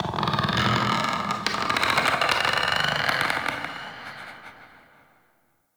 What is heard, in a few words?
Forest Distant Tree Creak; Warframe; Grineer